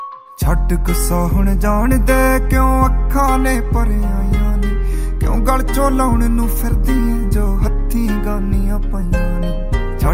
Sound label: Punjabi Song